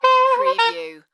Sax Accent

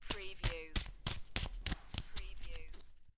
Playing Cards Shuffling Cards In Hand

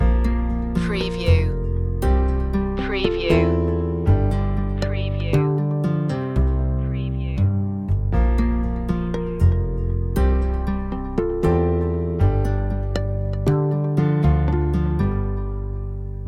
loop, chill

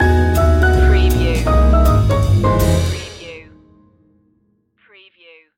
in paris stinger

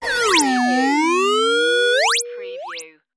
tonal transmission interference

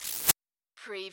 CARTOON ZIP

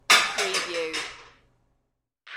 Plate Stack

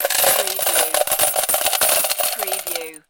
Slot Machine Win - Coins Falling Into Payout Slot

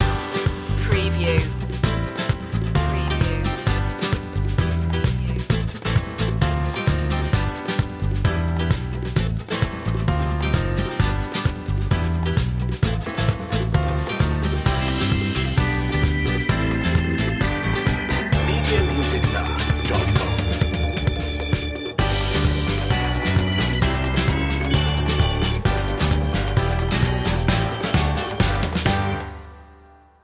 fiesta celebration no brass marimba

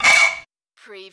Button Sound - Metal Scrape Short

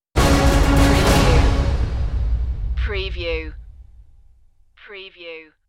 stinger

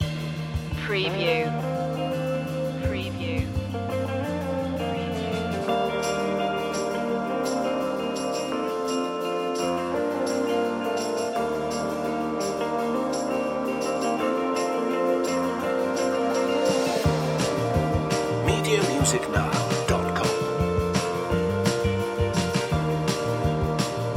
summer ride loop

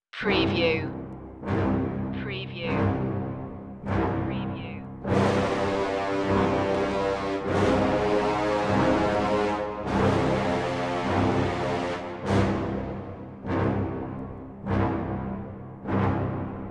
dark opening no percussion

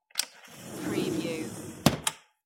Aluminium Window Slide and Close

